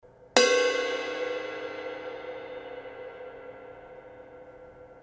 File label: Kerope, cymbal sound